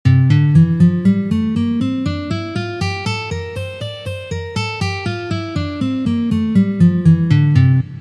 Gamma Re minor naturalnyj vid 2 pozitsiya

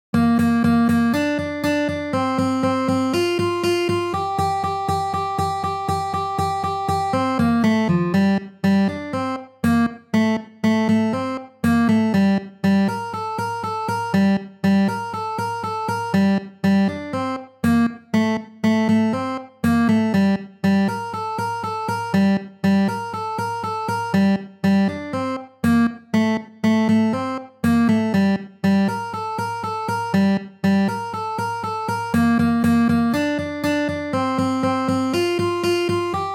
taby dlya gitary

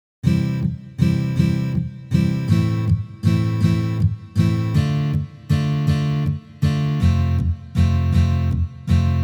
Valsovyj boj na gitare

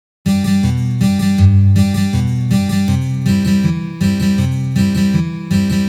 Boj Kantri na gitare

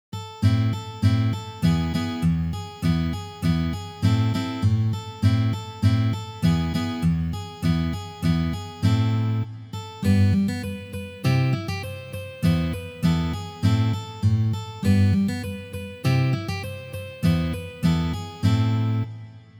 na gitare

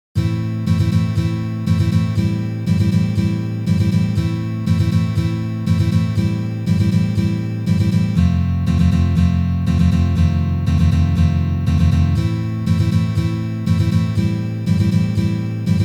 Uprazhneniya akkord Am7

